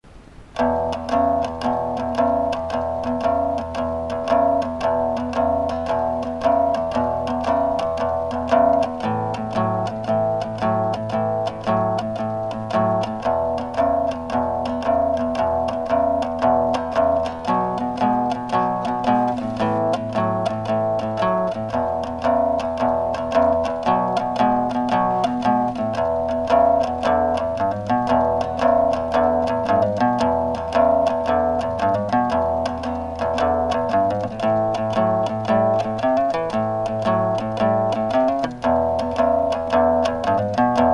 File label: blues